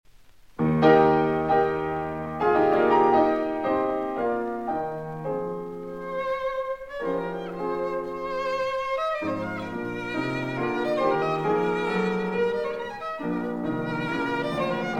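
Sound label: violin sonata; strauss rich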